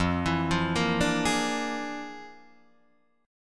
F Minor Major 13th, Guitar, DADGAD

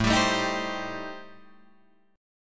A-Minor 7th Flat 5th, Guitar Drop D, chord

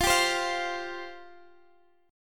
F Suspended 2nd, down Guitar Open G

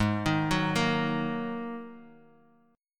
Bb 7th Suspended 4th Sharp 5th Ab

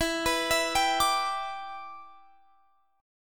E Minor 7th, Guitar, Standard; arpeggiated

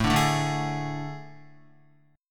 A Major 7th Suspended 4th, Guitar; strummed; JGuitar

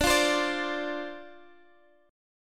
D-Minor 7th D, chord

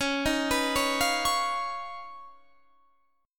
D sharp 7th Suspended 2nd Sharp 5th

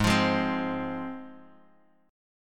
G sharp 6th G sharp down Guitar Drop D, chord

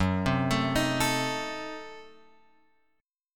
F sharp Diminished 7th F sharp; F#dim7 Chord {2 3 X 2 4 5} arpeggiated

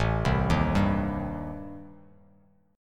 A-Minor 7th Flat 5th, Bass Standard, chord; arpeggiated; JGuitar

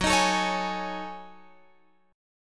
F sharp Minor 6th Add 9th, Guitar, Open F; F#m6add9 strummed; x,13,15,16,16,15

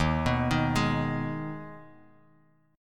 D sharp Suspended 4th Sharp 5th, Bass Standard; arpeggiated; JGuitar

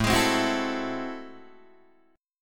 A Minor Major 13th, down, Guitar, DADGAD; AmM13; strummed

